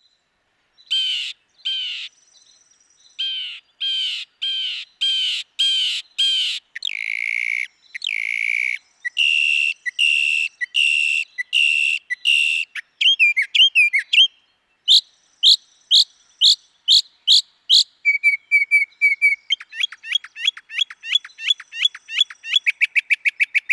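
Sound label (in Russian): mockingbird sound; zvuki ptits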